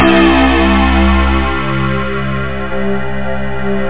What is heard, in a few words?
piano string